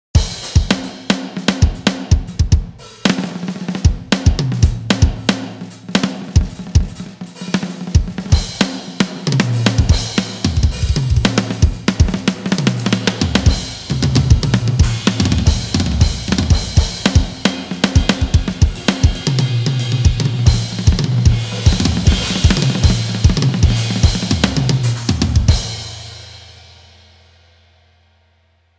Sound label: drum solo; bayarea2